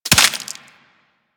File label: Headshot Impact Flesh; Warframe; Weapons; Bullet Impacts